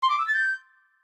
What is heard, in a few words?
Orchestral insert; Nokia E52; Orchestral MMC insert